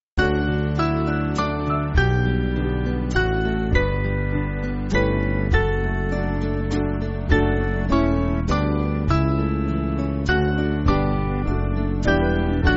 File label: Band, church music